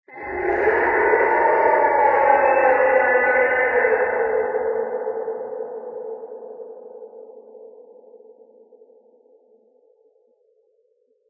Forest Scream

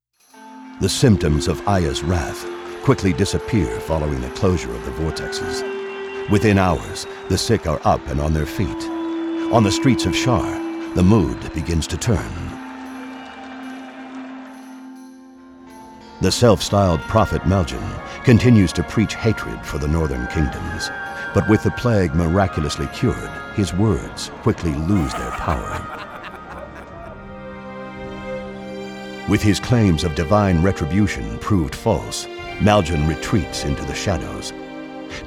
Cinematic; The Shadow Sun

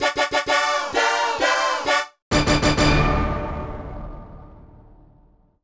Win - Sing

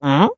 Western Excited; Cut the Rope Time Travel

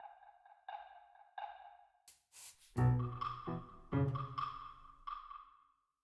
Field Battle Ground Easy; the legend of zelda tears of the kingdom

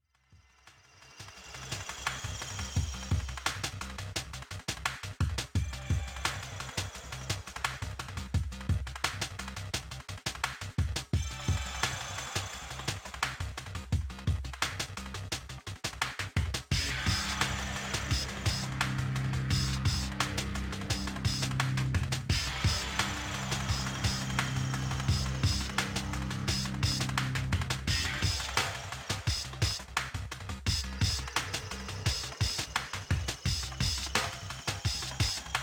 Techno Train; 3D Ultra Lionel Traintown Deluxe

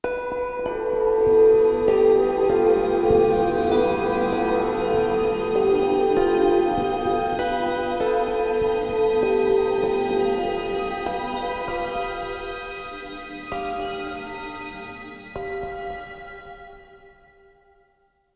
Mysterious Stinger; rise of nightmares xbox 360 gamerip 2011

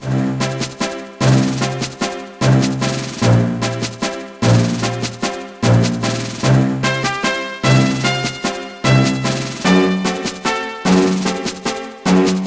Military Casual; madagascar operation penguin; gba

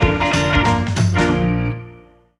Jingle Complete

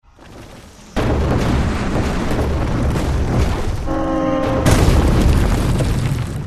Boss Outro

